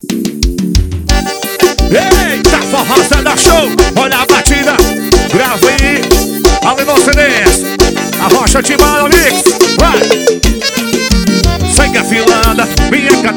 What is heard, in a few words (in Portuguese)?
forró